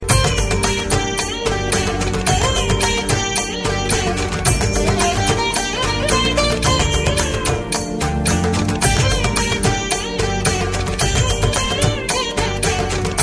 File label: classical tone